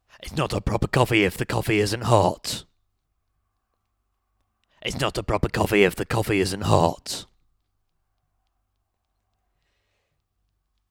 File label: temp vocals